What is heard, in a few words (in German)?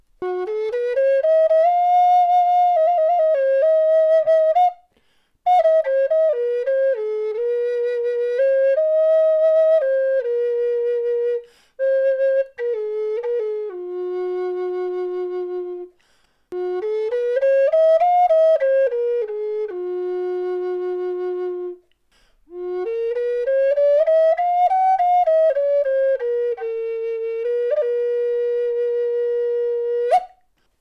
Hoerprobe F Floete Birke Birne